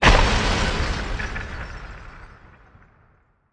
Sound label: asteroid damage oxide huge; Factorio